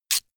tear harsh short

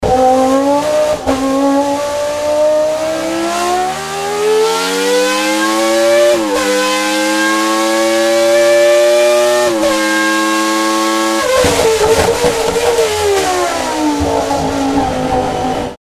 f1 engine acceleration

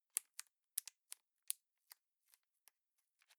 plastic bag small clasp close

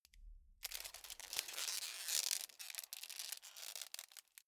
calamus creak short quick

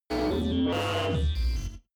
space computing machinery hard busy strange

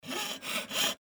metal sawing

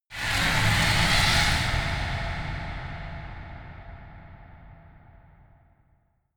mysterious call

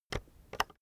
keyboard external enter press slow soft

